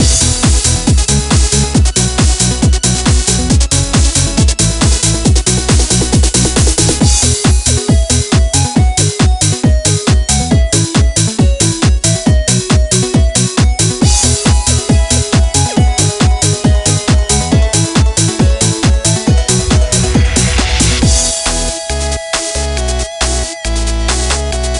Music Dance Loop; game rip; littlest pet shop garden jungle winter; nds

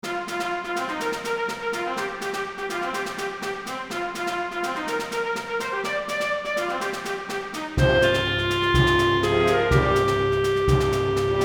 Military Tutti

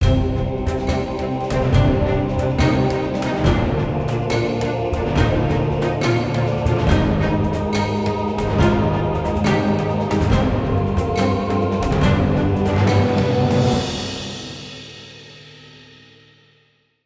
Battle Drums; Necropolis

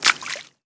land water

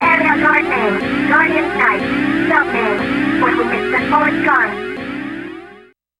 Warning

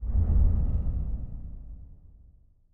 UI power wheel close

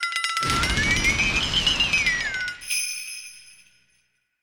door

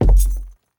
Footsteps Wood Walk; Devour; The Town

